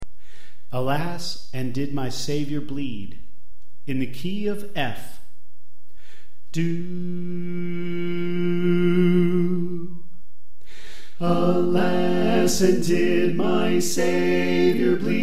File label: Soprano Left; Alas And Did My Savior Bleed